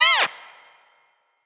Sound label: cry; Grito; Sweetaha; Sweetaha esta basado en lagarto corredor de Atacama